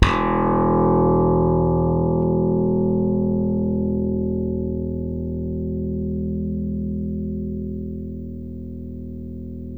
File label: SLAP BASS, Electric Basses